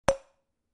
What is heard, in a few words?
bellplate corner; bellplates